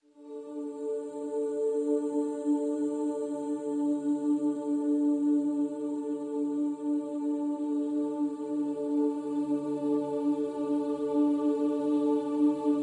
Pads Vocalicious (D)

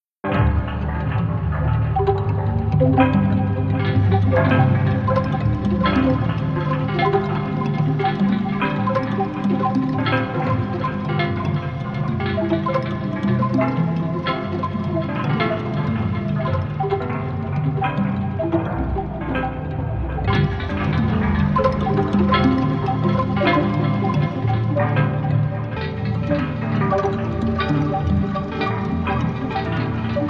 Bamboo House

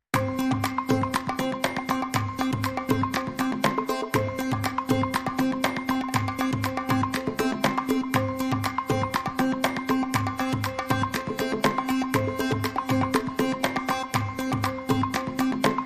Desperado Pizzicato, Rhythm

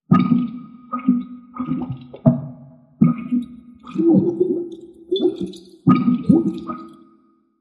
Blood Bubble Multiple Drips

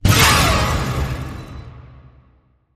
Scare Hit

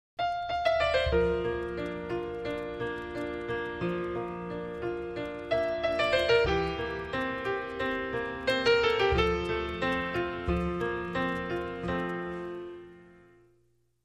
Piano Only

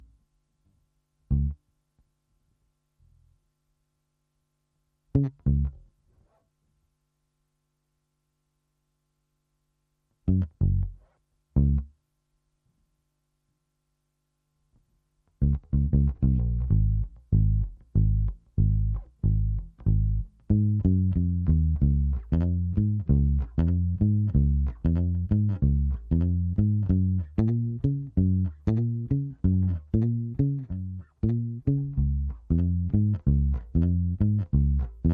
Bass

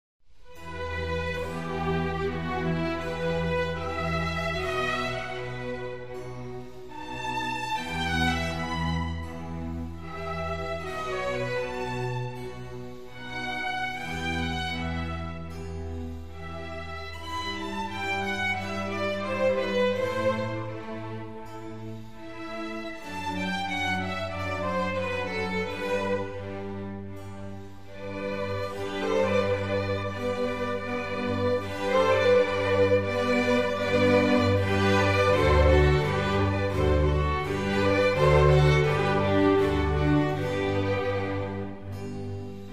Concerto for Strings in E minor; RV124; Antonio Vivaldi

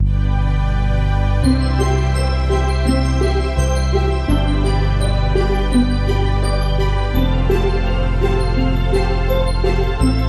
No Drums No Melody

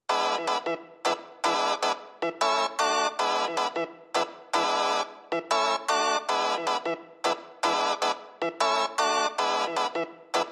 Organ and Synth SFX Only

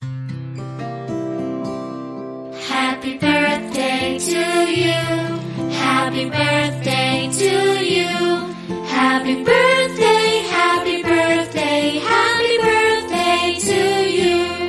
Children Vocal Happy Birthday

